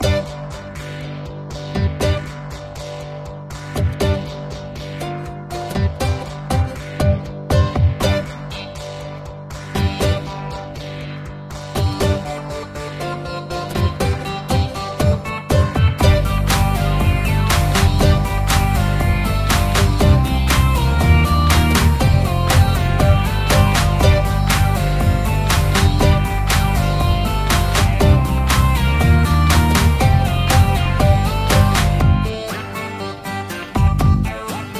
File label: Electro low